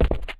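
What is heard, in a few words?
gritty cement land, footsteps; chief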